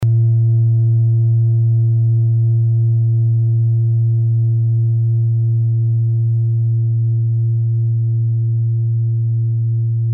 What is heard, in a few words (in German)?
klangschale ladakh